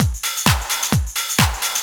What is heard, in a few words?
drum 130 groove; Techno Trance Essentials